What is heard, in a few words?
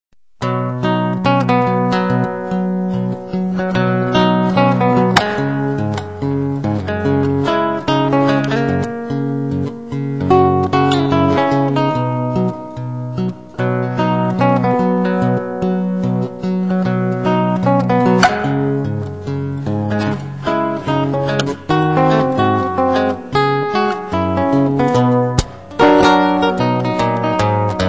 jingle